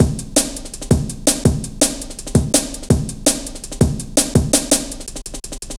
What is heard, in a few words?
Ruffneck, Drum Loops 165.5